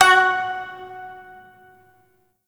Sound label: Shawzin Oct Two F Sharp; Warframe